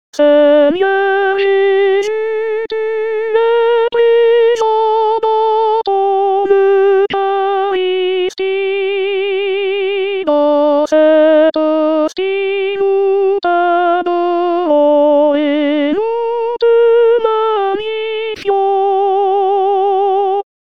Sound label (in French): Seigneur Jesus Soprano, Chorale